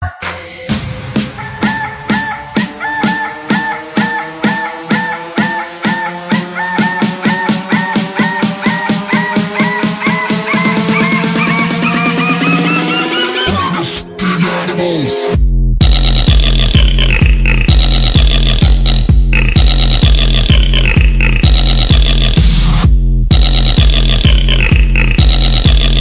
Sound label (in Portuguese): despertador galo cantando dubstep; toque de celular